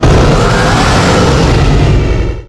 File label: pokemon cry; primal